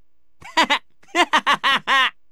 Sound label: shol laugh; sho minazuki